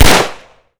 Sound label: pistol fire; hl2